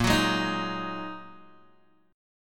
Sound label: Bb 7th Suspended 4th Sharp 5th Bb down Guitar Open G